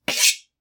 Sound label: knife Slice; kenney rpgaudio